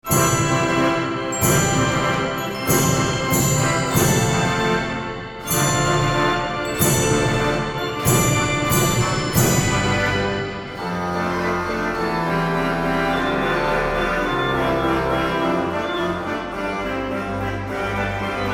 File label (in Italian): Marcia, organi; APPENINO BOLOGNESE; Anonimo